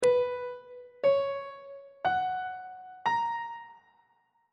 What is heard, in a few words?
a double sharp major 7th suspended 2nd chord intervals on treble clef